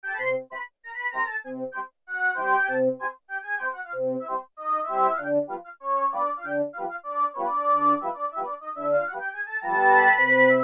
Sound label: CIRCUS ORGAN, Oberheim OB-8; Factory Patches